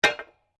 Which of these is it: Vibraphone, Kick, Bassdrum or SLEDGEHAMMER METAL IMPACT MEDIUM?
SLEDGEHAMMER METAL IMPACT MEDIUM